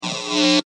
Electric Swell Zap, Electricity buzz swell with electric arcing; Electrical Sound Effects; Electricity sounds